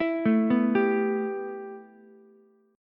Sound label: A 7th Suspended 2nd A, Ukulele; A7sus2 arpeggiated